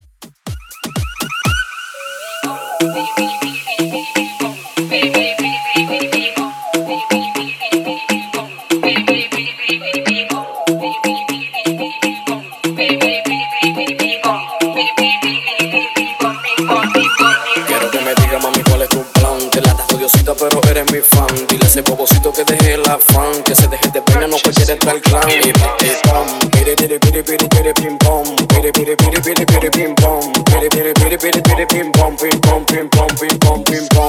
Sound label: Tribal Re Drum 122 BPM; Ext